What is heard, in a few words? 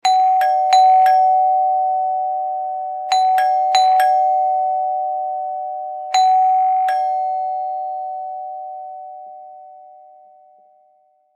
Ding dong doorbell rings